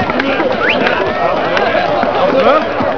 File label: CROWD LTL; PEOPLE